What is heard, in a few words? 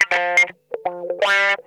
TIMER, Funk Guitar; Zero G - Funk Guitar